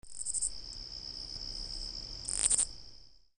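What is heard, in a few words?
kuznechik, grasshopper; Кузнечики; teatralnye shumy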